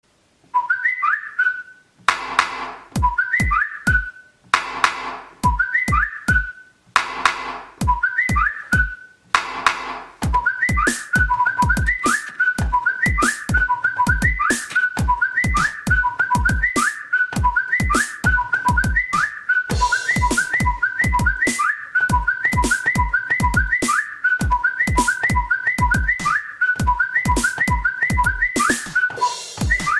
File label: Samsung Remix ringtone